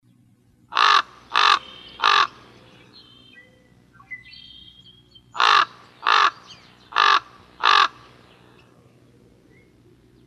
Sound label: Corvids; AZFO; deviche